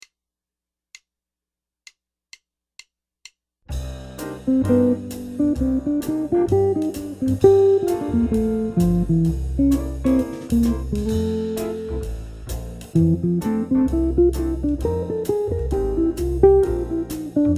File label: solar guitar